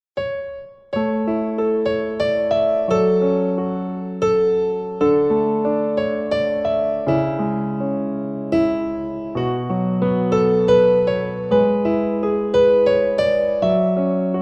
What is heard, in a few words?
Piano; Fantasia III